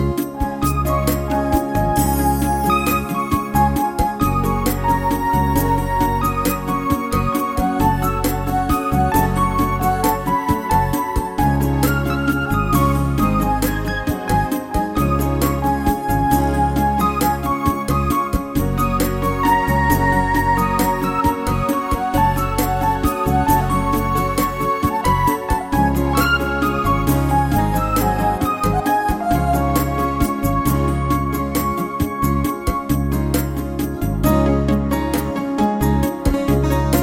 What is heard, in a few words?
Ambient Ballad